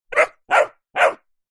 animals dog puppy several weeks old barking ridgeback cross bullmastiff